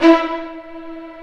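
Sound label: Vio spiccato E3; Digital Sound Factory Virtuoso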